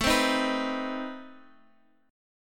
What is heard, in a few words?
C Minor Major 7th, A, guitar chord